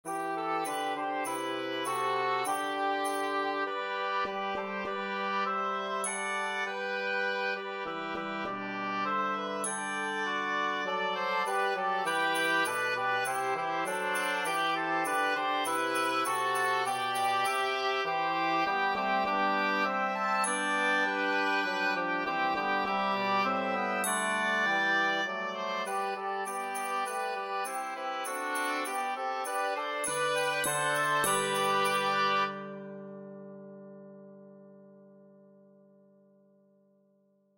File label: Oboe Track with Melody; The Holly and the Ivy